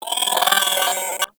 Vocal Death; Hyena Micro

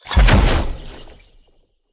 Staff Caster Cast Heavy Shot; Weapons; Player; Staves; Wazzard Staff Caster

